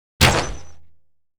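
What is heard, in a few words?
Archer female attack; Mob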